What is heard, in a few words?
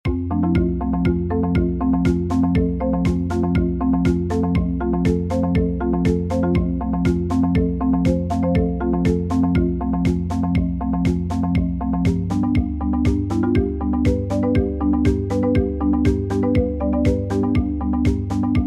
Marimba; Marimba Parimba; maestro vol. 2